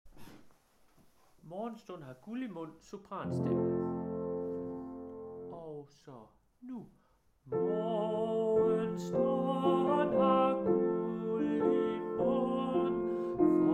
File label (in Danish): sopran; Morgenstund har guld i mund; harlev koret